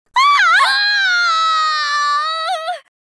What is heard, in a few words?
female scream